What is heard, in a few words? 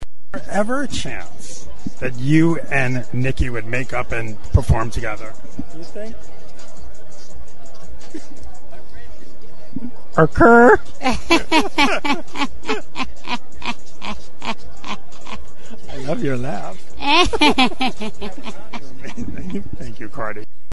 laugh